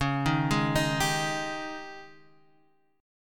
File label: G sharp Major 7th Suspended 4th, C sharp